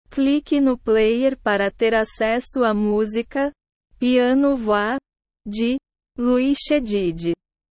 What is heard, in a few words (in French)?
piano voix